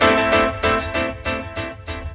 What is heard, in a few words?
Dub Piano; Sonic Foundry (Sony Creative Software); Ambient Grooves; Dub Hits